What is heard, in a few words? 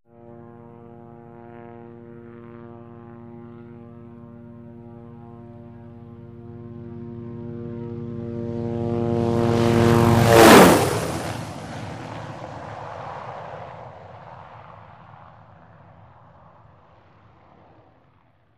Hughes 500 Single By M